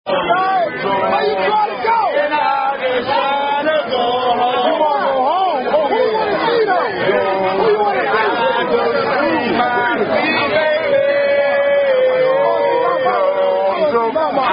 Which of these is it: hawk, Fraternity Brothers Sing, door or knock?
Fraternity Brothers Sing